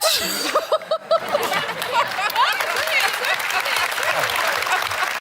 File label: crack up